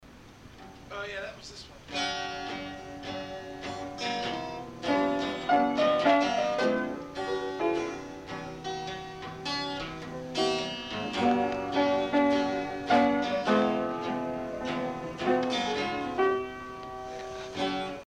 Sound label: Banter Piano